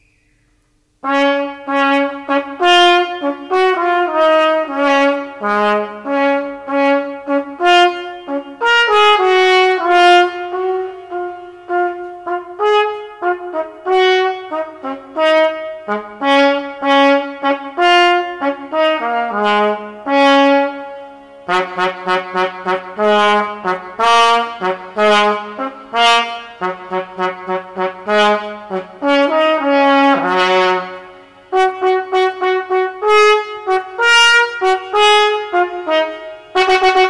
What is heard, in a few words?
Trumpet NO PIANO; Grade 3